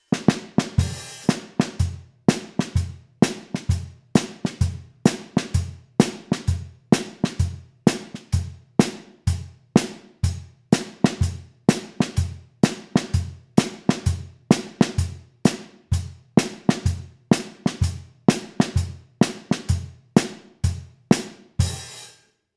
EC 1 Drum Set Original; Cranborne

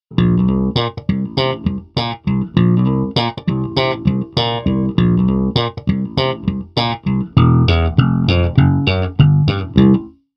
Fender 60s JB

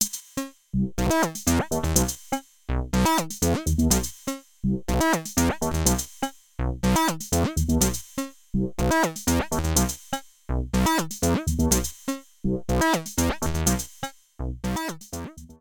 System 500 PWM LFOs Filter LFO X Sync

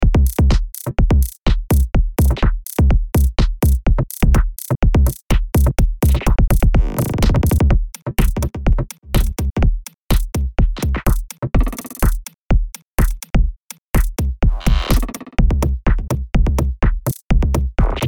BT Minimal

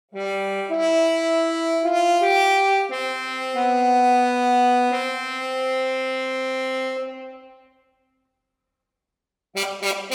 Orchestral Trombone; Orchestral Tools Glory Days

